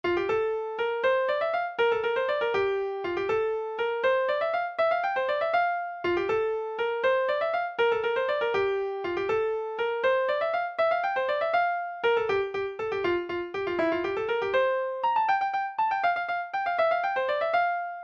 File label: waltz, mandolin; york